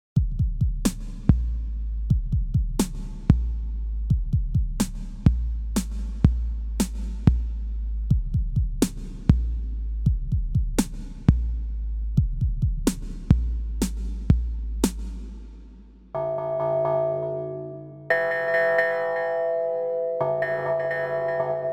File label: kathedral house; Softube Heartbeat; Drum Synthesizer aus den 1970er und 1980er